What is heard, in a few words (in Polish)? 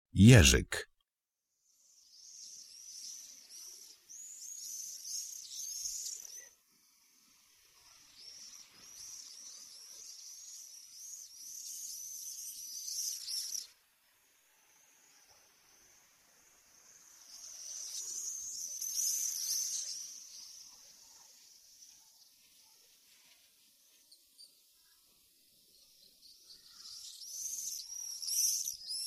JERZYK